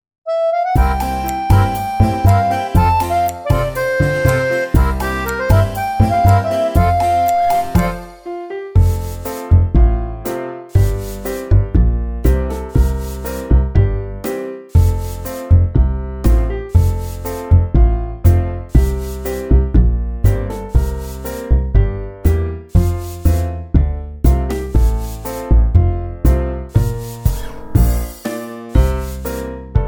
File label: Karaoke